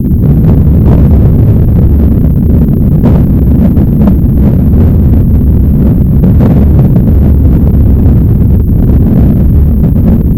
BGM Ambient; ps4 switch gamerip 2021; Smashing the Battle: Ghost Soul

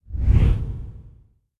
Boulet Engine Whoosh; Puddle; puddle plus ios gamerip 2018

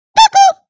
Sit leg poke

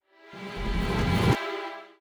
Bumper

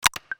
Standby; nintendo switch sound effects

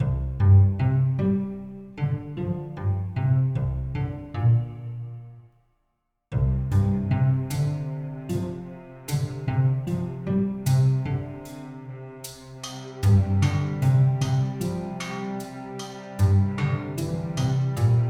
Night

